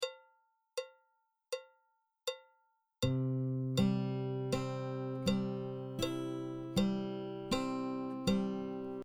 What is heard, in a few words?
Arpeggio